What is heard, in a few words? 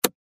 notification